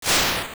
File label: Appear Fly; the Gasha Tree Withering sound is used for flying during battl; Twinrova appearing and flying (outside of battle